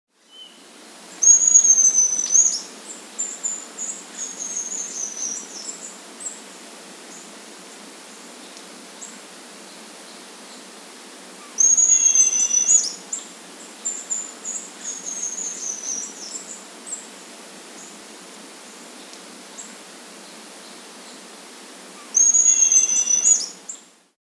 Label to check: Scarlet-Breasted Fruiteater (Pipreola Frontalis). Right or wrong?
Right